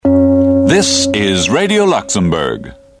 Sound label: gong